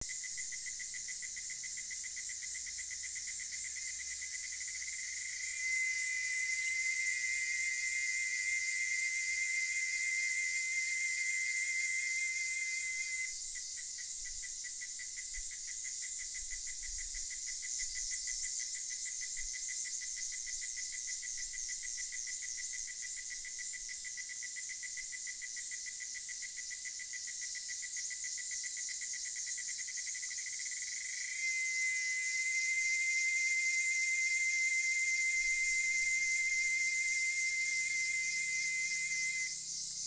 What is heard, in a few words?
cicada